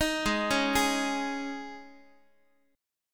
G sharp Suspended 4th G sharp, Guitar; arpeggiated

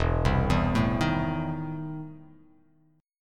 E Major 7th Suspended 4th Sharp 5th E down; arpeggiated; JGuitar

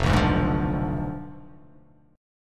F Diminished 7th F down

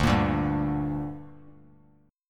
D Suspended 4th Sharp 5th D down, chord; strummed

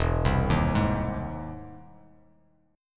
E Augmented Major 7th E down; E+M7 arpeggiated; JGuitar

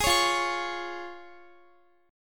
F Minor Sharp 5th F down Guitar; strummed; tuning 4E,4A,5D,5G,5C,6F

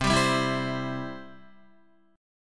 C Major, Guitar; strummed; JGuitar

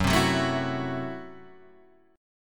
F sharp Minor 7th Flat 5th F sharp; strummed; JGuitar

